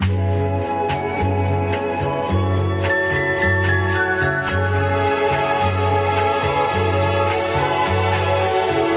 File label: flute sambaness, Jazzmatazz loops